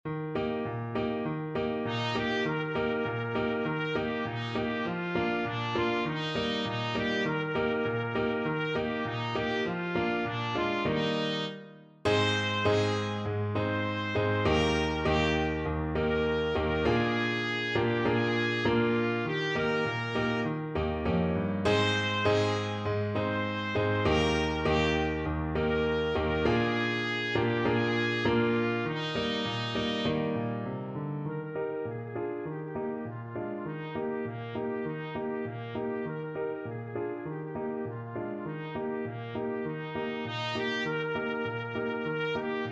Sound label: ging gang goolie trumpet